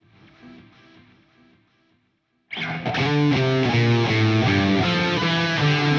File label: Music Down Beat Guitar; Plants vs. Zombies Battle for Neighborville; GnomeGnight